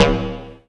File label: DARK FM; Q Up Arts; Dream Experience; DRUMS PERC